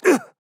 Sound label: Grunt Hit; DarkLord